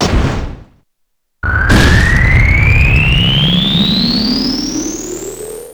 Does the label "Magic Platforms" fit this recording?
Yes